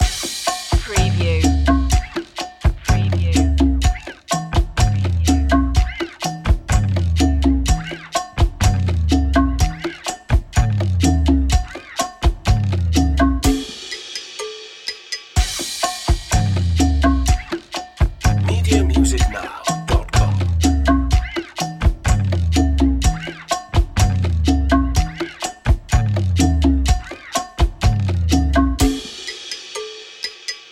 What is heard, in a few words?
latin fiesta on the wall loop